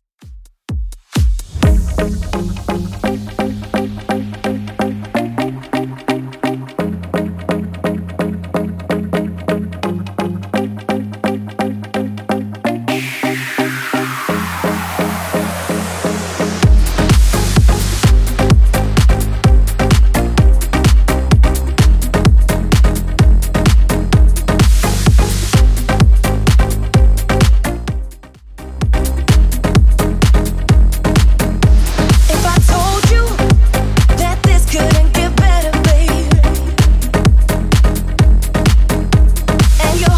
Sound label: 2ks Redrum 128 bpm